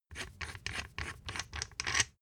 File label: thread screw unscrew